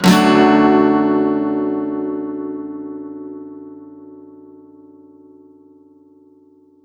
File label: Guitar 128 BPM Dmaj, One-Shots; Ghosthack Advent Calendar 2021 - Day 10; Guitar Loops and One-Shots